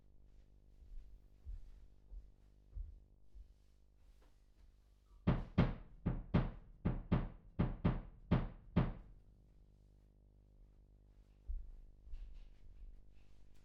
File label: Voc Fig8